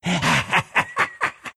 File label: laugh; Bount